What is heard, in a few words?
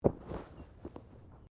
Body movement Clothing Foley